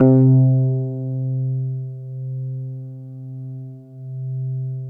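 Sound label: FM electric piano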